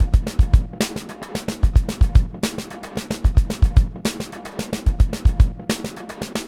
148 inverted snare beat

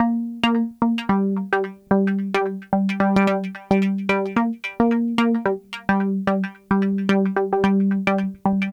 Gm Synth, 110

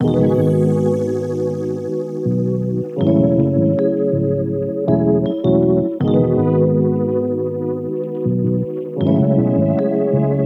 Layered C m 80bpm; Autumn Vibes

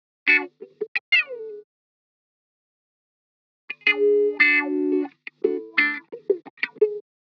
87, Bm, Wah Guitar Licks Loop

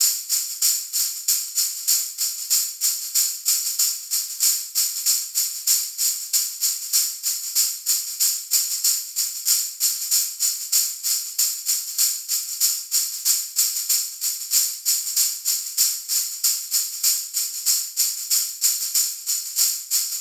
Tambourine 95BPM Acoustic RNB

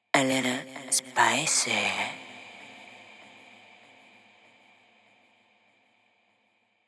122 G Vocal; Little spicy

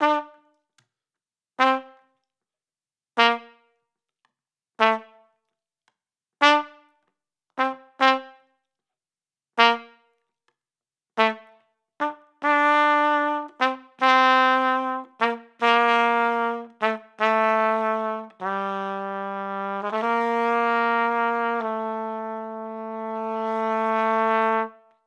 75 flugel melody Gmin

